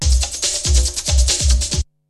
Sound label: BREAKBEATS, TECHNO